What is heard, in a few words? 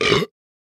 burp